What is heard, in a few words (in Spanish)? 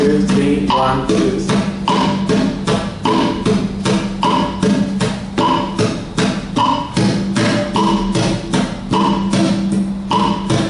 Rasgueo, guitar